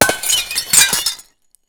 percussive

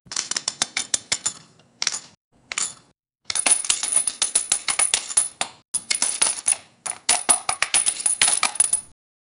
cash counting sound

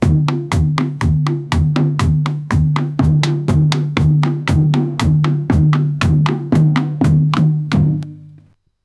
thavil drum beats in festival

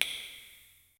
a slime short dash in, cave

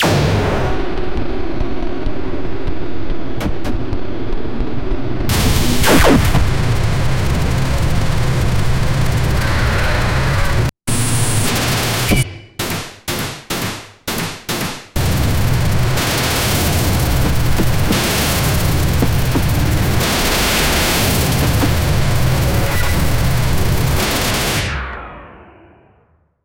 epic sci fi battle humans

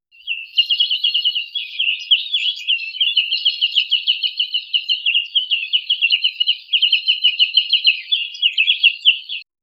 raw bird chirping sound

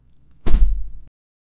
jumping with the umbrella; leisure

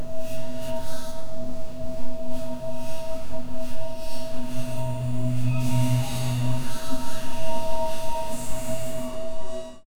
ships and magical technol

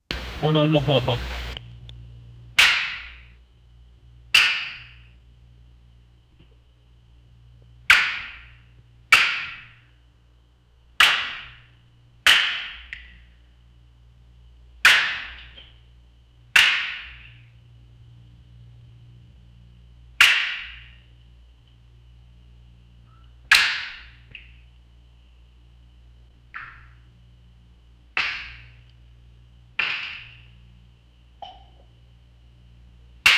magical nutcracker awaken, night ambience

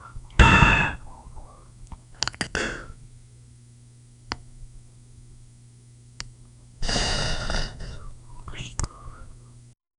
make me a sound of, cars

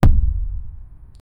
harsh concrete collision, bassy

